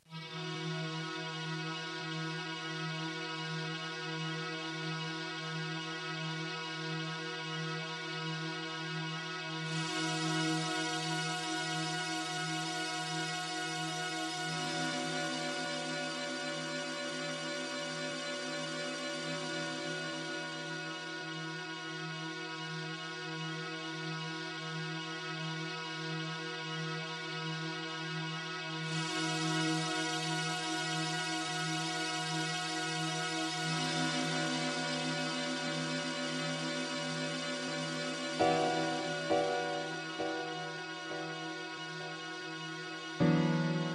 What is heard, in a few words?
ambient meditation AMBIENT CAVE